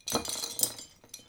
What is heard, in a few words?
crystal crumble